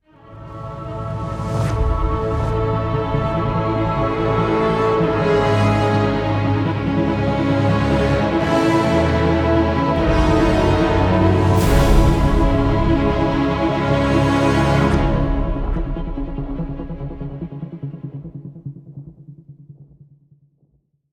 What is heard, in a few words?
Success; SideStory MUSIC Command Beacon Success